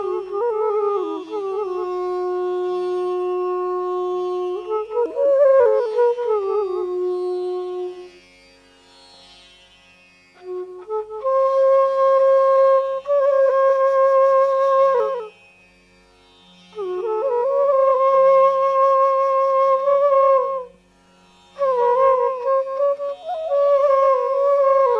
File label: Raag Bhairvin - Flute